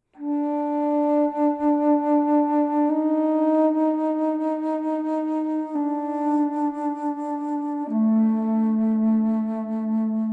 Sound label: Old Houseboat - Loop, Bass Flute Stem; ps4 ps5 switch windows xbox one xbox series xs